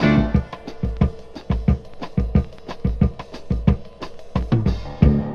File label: synthy, Funky Drum loops